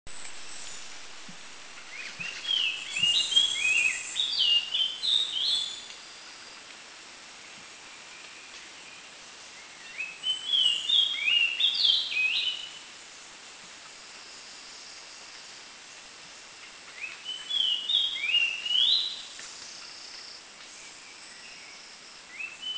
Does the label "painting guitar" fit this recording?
No